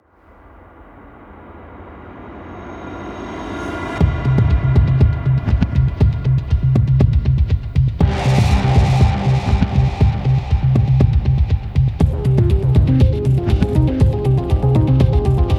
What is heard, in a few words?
full instrumen